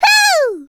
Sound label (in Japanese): ふぅー, Breath; Voice&Breath; Vocaloid 6.5.1; Otomachi; VOCALOID6 AI OtomachiUna Spicy